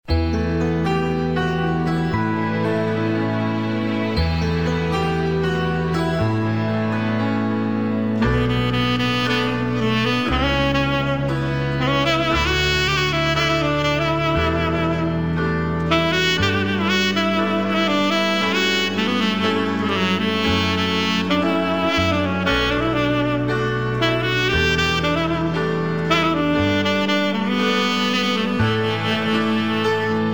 sax

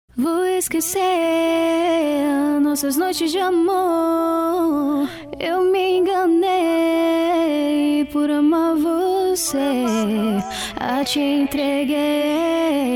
acapella; vou pro mega princepe

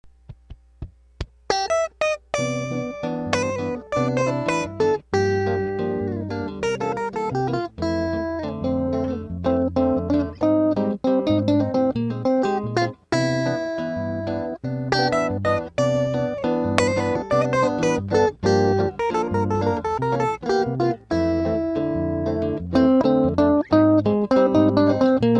instrumental

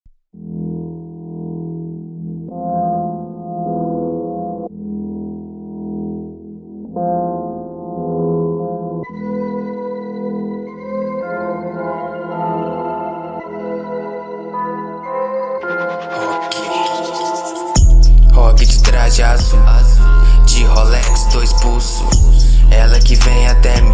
rock

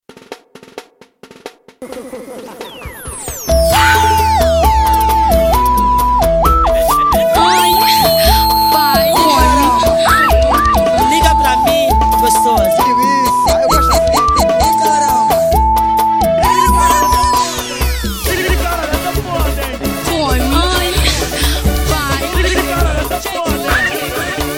groove

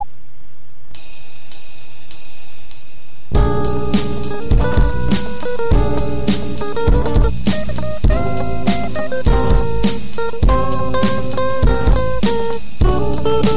austin bass

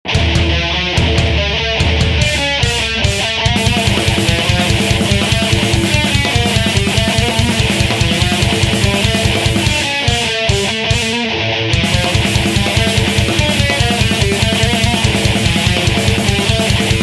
prelude instrumental

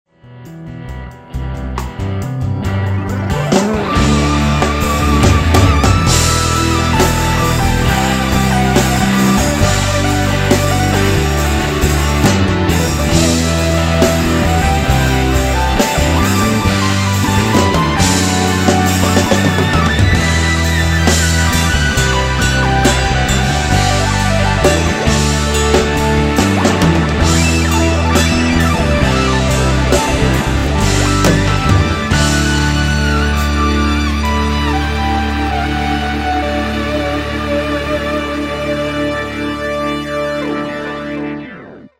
solo

